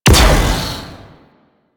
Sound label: Alt Shot, Weapons; Warframe; Corpus; UMP; Tetra